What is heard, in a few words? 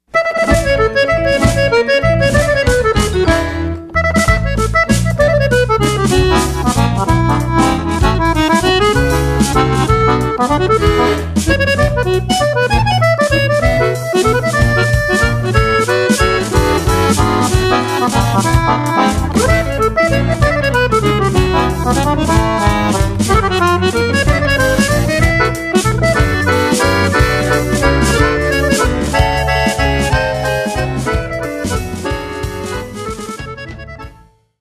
jazzy waltz